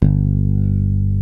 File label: BASS G2, ELEC BASS; East West - Global Sounds